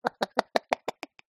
cartoon character drink glug gulp; zapsplat

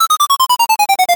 arcade game fall tone

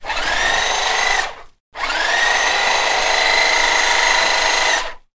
household hedge trimmer electric 2 bursts; sound effects one

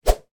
sport badminton racket fast movement swoosh; sound effects one

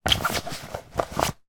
foley hand movement inside thick rubber bag

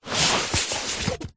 foley garment bag fabric suit movement across bag

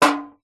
impacts metal small tin like hollow hit tap

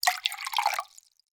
vodka pour from bottle into glass; food drink

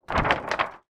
large poster movement

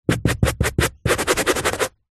household brush scrub carpet; zapsplat

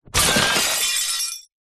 impact crash mostly glass hard

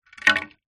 boat wooden oar old down on hard ground

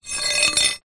foley metal rod scrape on ground

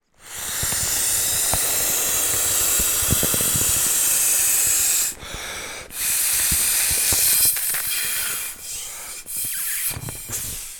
toy bop bag inflating by mouth

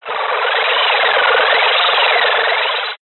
science fiction radio signal interference burst

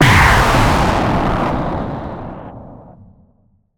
Impact Boom Distorted; bottlerocket

